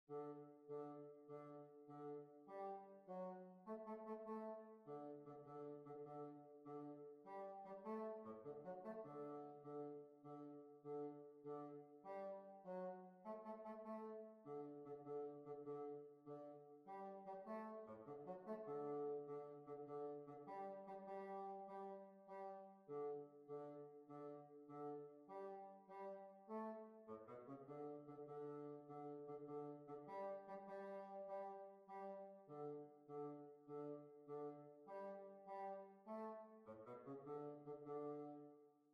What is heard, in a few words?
Kings Bridge Assembly